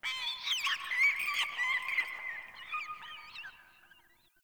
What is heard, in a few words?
Bird Mountain; Ambience; One Offs; Glades Of Andurin